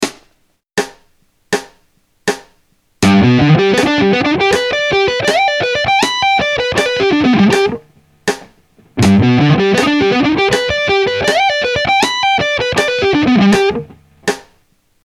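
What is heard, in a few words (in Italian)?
Triadi Sol magg, rock guitar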